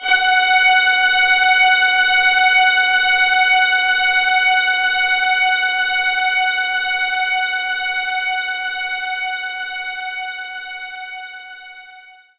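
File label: String Loop F#4; knights try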